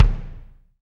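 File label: bass drum, Real Kit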